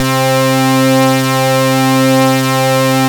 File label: Synths Note C; House; Best Service; House Definition VOL-1